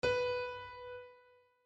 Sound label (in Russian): pianino SI